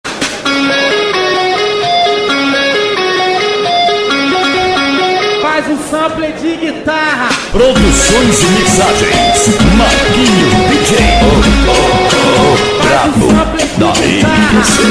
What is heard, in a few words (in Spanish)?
sample de guitarra toque